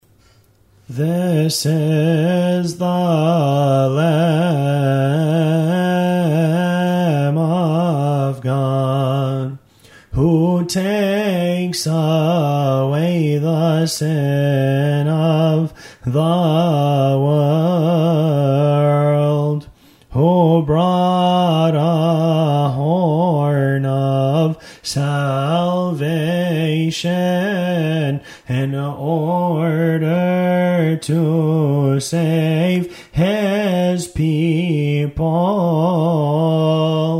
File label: liturgy gospel response, hymns